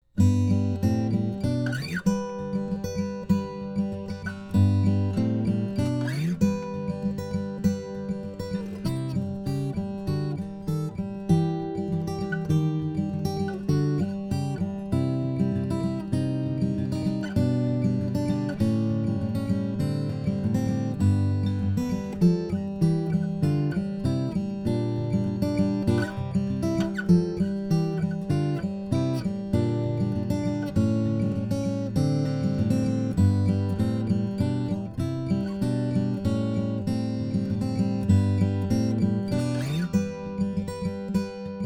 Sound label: finger, guitars